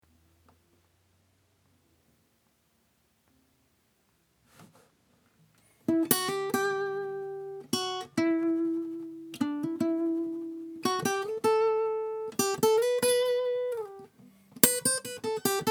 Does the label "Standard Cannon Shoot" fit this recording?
No